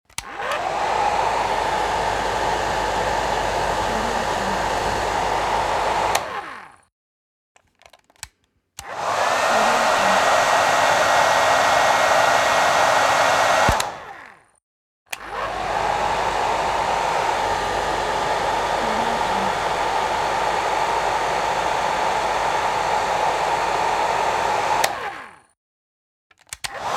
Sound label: hairdryer